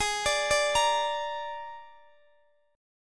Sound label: Ab Suspended 2nd Flat 5th Ab Guitar DADGAD; arpeggiated; Absus2b5; x,x,18,19,17,20